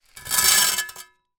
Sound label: industrial shovel metal large drag concrete; zapsplat